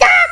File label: Fight - Daaa; Koji the Frog 2.0.1